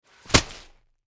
Bag Shot Sand Drop Fall Impact Weight; Drops (Sound Effects:Objects); Foley; Blastwave

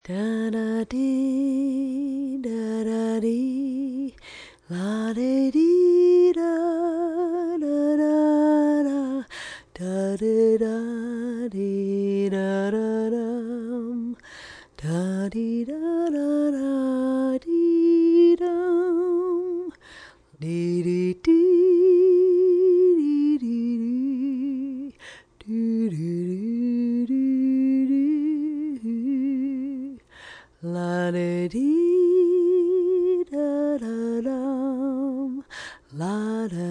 Singing Lullabye, Singing, song, female; VoiceBox

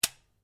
RIFLE REMMINGTON700 7.62MM SAFETY OFF; A selection of domesttic firearms; Rifles; Guns

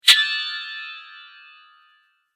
Chime Whoosh; Whoosh Sound Effects; sound design

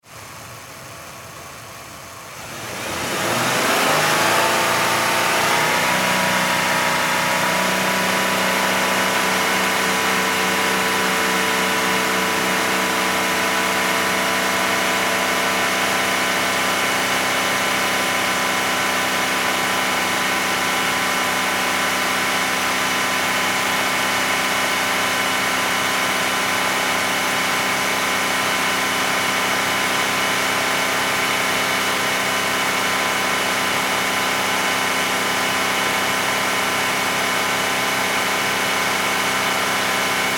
Car Mercury Sable High Rpm; Car 2002 Mercury Sable High Rpm; Power Car sounds; Car Sound Effects

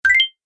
Gameplay Bonus, video game

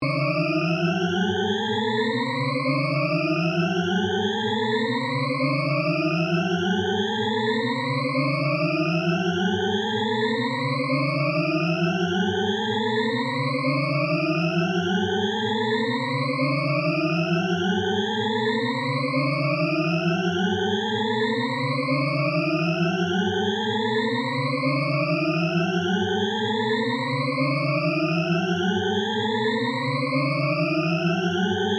Shepard Tone Up, Synth; Synths; Musical; BlastWave

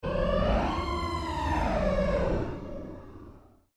Created Cyber Whale Call, Alien Sound Effects

